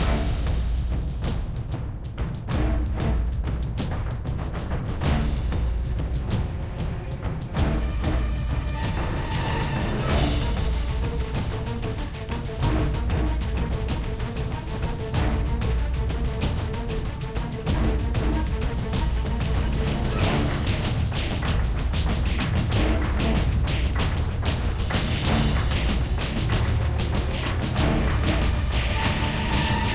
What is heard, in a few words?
Level Battle; Epic of Kings; android ios mobile gamerip 2016